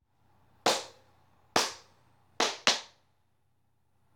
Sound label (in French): rythme cuisses